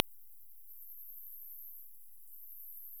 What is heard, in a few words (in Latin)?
chiroptera